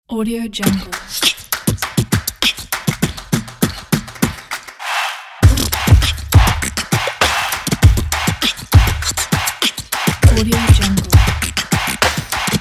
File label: beatbox kit